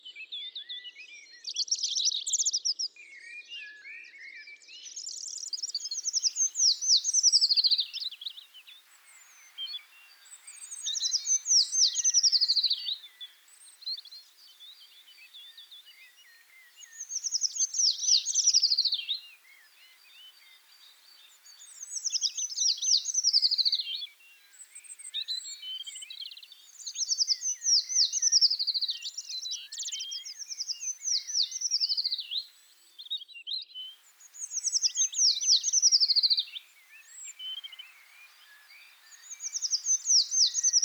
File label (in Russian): pishuha; zvuki prirodi